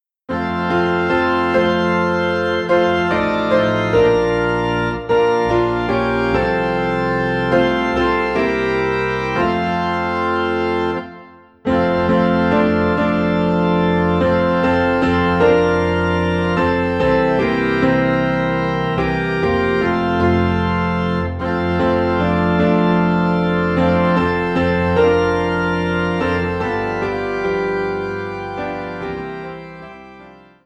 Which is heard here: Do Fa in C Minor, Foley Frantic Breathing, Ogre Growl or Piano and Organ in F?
Piano and Organ in F